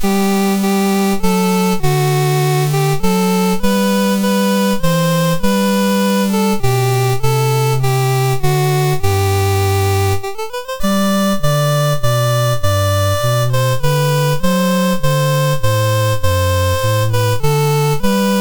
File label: English Anthem, MOS6581R3